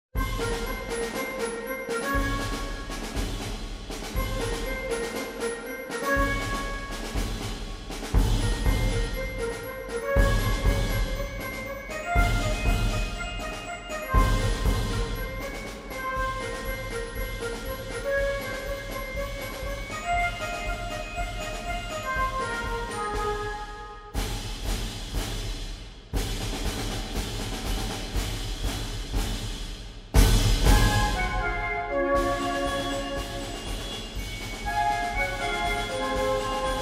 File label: Hits For Marching Band